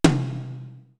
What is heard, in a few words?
ATOM, DRUMS; RY30DRUMS; East West; Global Sounds